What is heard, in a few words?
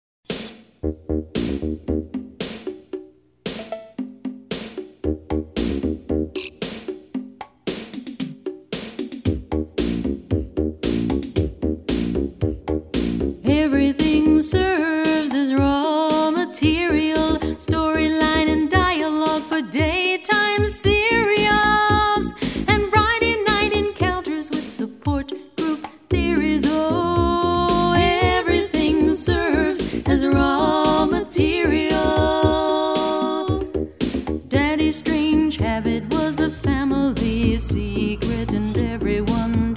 Singing Duets; Radio Rainbow Artists Guild